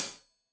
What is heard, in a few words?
Hat Tight, Hi-Hat, Cymbals; Deeply Sampled Drums